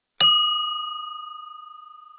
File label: Bell Codes, block Signalling